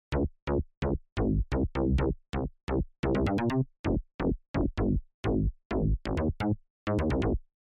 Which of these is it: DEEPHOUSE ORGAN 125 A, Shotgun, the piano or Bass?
Bass